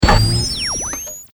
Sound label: Science Fiction Monitor Turn Off Power Down; Computers